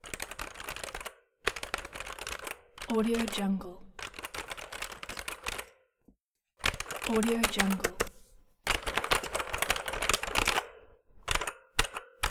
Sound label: typing keyboard; nbkstudio